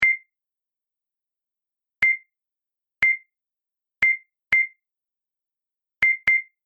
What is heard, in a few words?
Scanner Beeps Barcode Reader; Beep Sound Effects